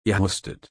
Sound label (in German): husten